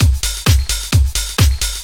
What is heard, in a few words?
dr t 130 trance 2; Ueberschall; Techno Trance Essentials; DRUMLOOPS